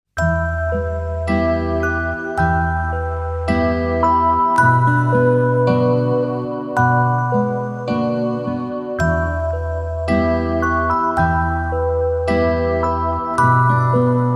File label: BELL SUSTAIN